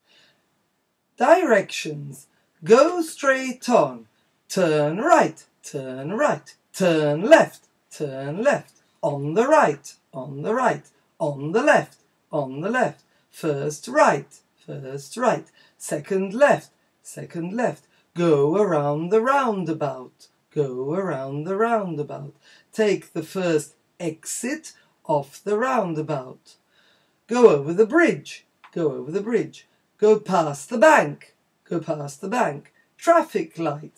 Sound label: directions chant